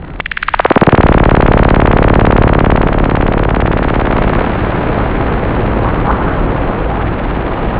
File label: zapppp